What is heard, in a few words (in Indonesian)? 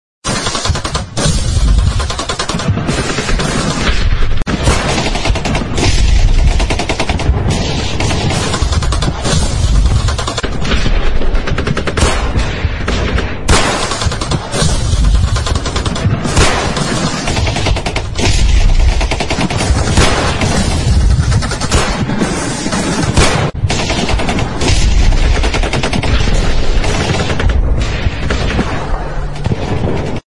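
suara erang, groaning sound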